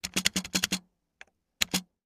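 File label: computer keystrokes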